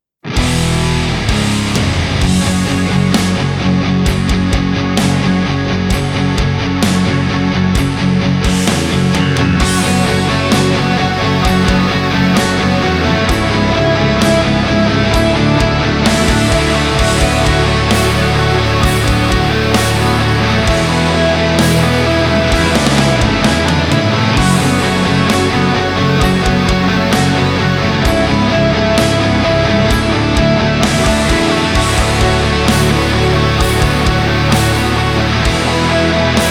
rainside instrumental

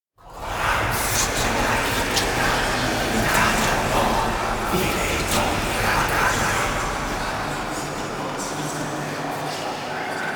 drone voices in my head

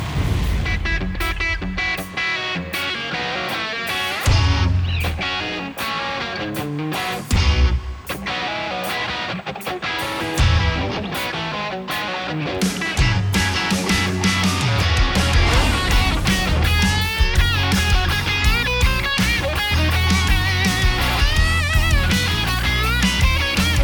instrumental jam

